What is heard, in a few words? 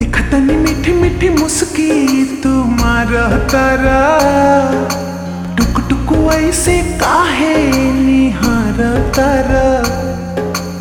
Ringtone Slowed Reverb